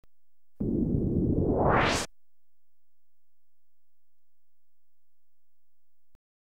Sliding Door (Slow, No Hum), Electronically Generated Sounds; BBC Sound Effects Library